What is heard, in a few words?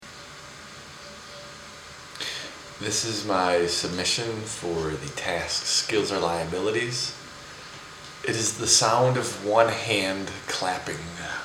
zen clapping; Skills Are Liabilities